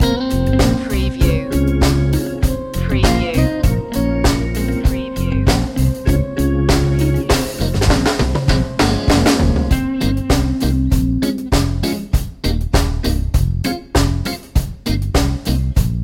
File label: one stop reggae loop